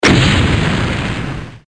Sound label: rocket explode